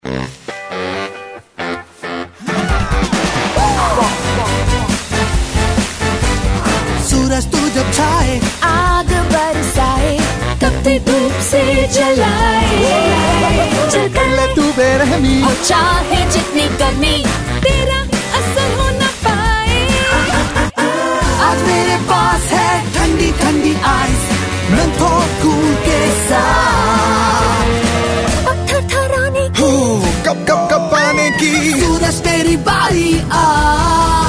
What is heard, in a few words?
Cinthol soap Cool TV ad Song